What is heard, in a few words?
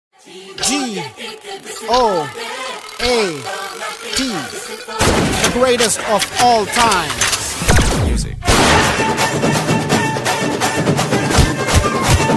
release promo bgm ringtone